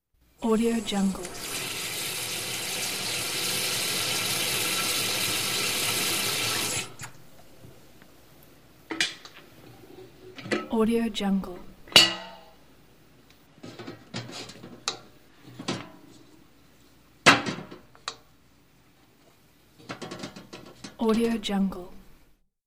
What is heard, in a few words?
gathering water in a kettle